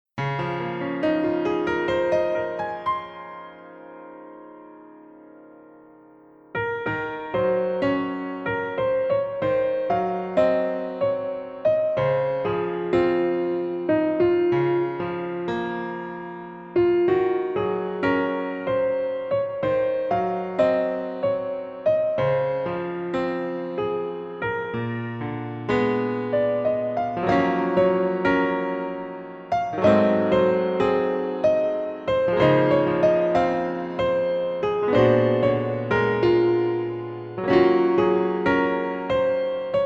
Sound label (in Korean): Piano ver 멜로디MR -1키